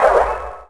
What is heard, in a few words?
Leak Plugged; Finding Nemo; XBOX